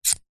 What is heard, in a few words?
foley metal slider small slide; zapsplat